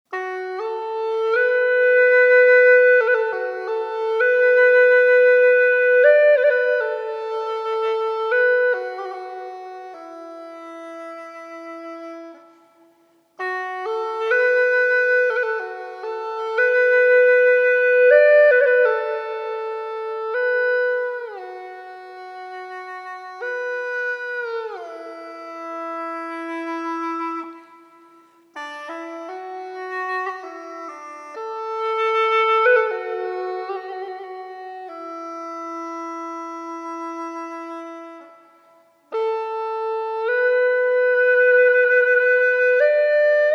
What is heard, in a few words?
Hulusi Solo